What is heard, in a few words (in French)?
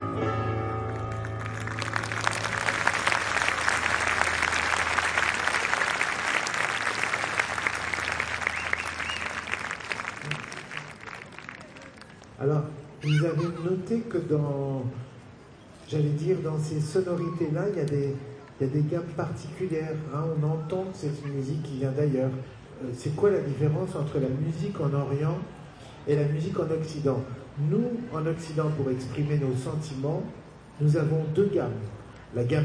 Piano sur lac Binaural